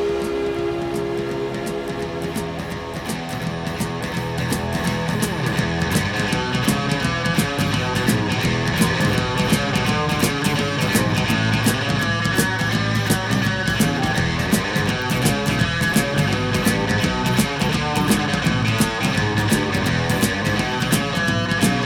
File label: Guitar boogie